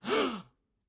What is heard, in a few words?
audience gasp